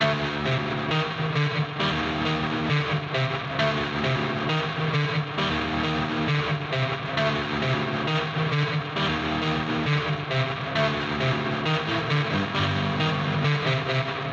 Melody Loop Dmin 134BPM

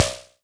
SNARE ANALOG

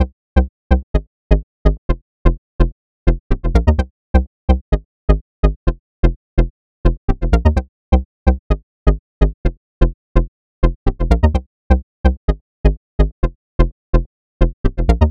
127 Cm Bass

